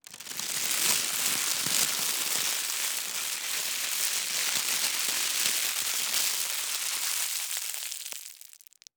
Ultrathin Cellophane, crumble, long, full spectrum, crunchy, delicate, crispy, crushing, fluctuating; Vaeyan I; layer2